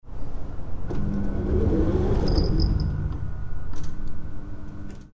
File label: auto door